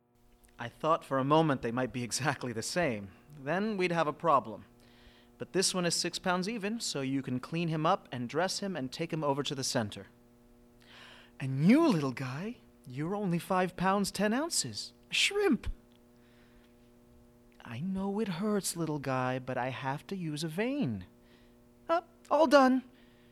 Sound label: baby